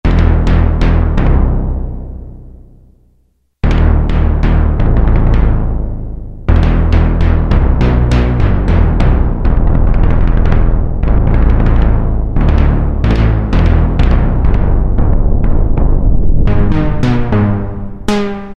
Timps, Roland MKS-50; Factory examples

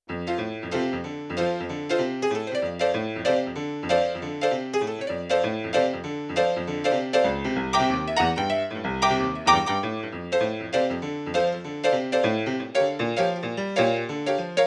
Rock & Roll Dark, Yamaha CFIII Grand Piano; Yamaha TX16W

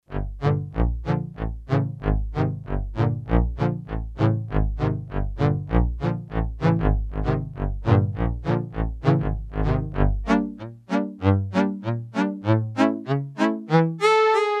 Cellos, Yamaha TX802; Performances; Factory Patches

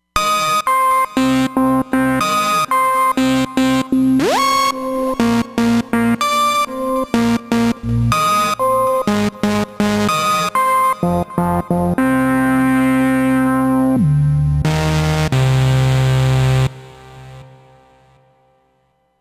Dirty D-A, Roland Fantom XR

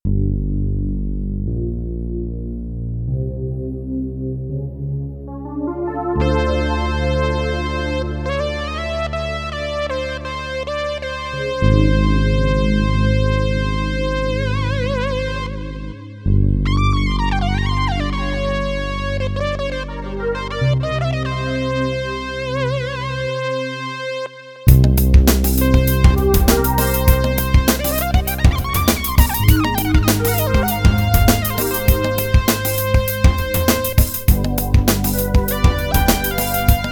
Fusion Split, Korg Radias-R

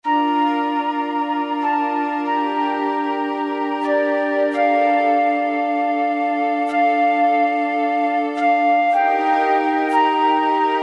Flute Symph

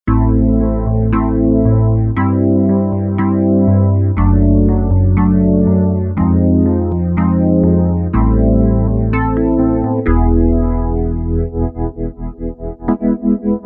JX EPiano, Roland V-Synth; Factory Patches

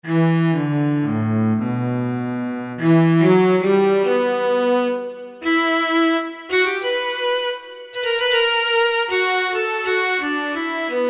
Cellos, Korg T3

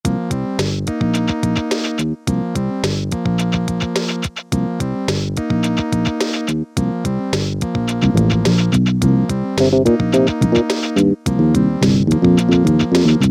HEAVY RAP, Casio Rapman rap patterns

